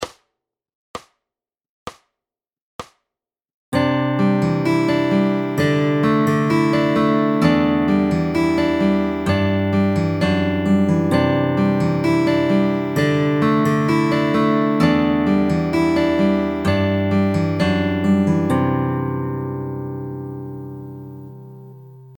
Fingerstyle Chord Anchors

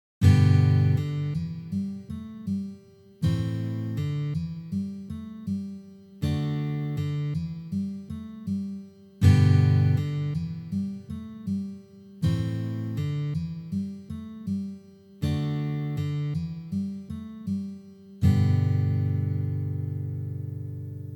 Hammer Ons

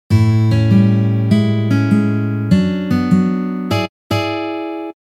Blues Guitar Turnaround

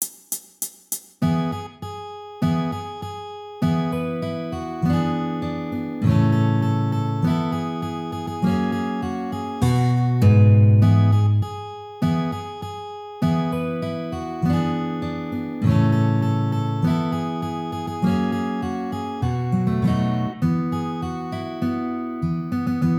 Jingle Bells Medium

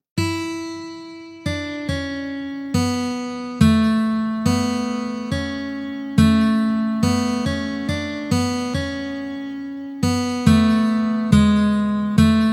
Deck The Halls Easy Slow

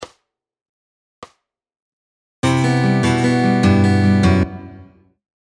Fingerpicking Lick Variation; Fingerpicking Fun

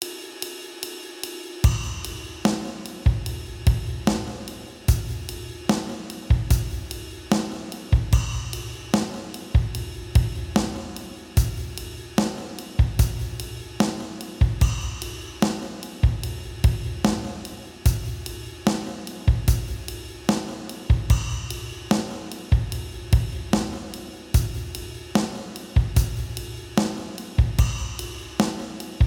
Drums

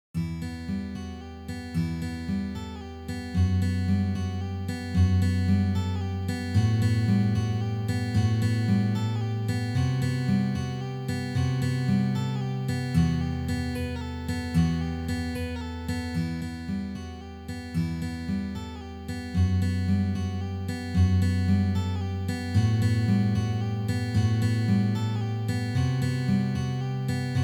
Slides

